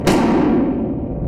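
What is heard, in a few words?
rifle shot in a field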